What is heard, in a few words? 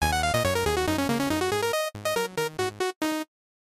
8-Bit; Jolly; Death By 8-Bit